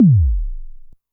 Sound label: korg trident